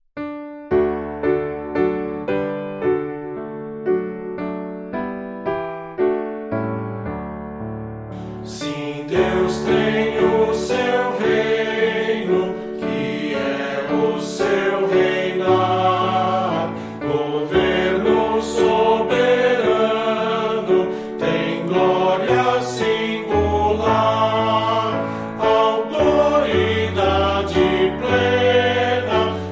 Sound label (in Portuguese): voz piano